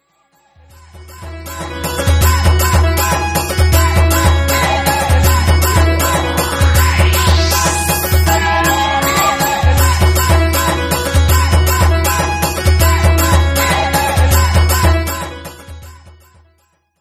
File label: Oppo Reno 5G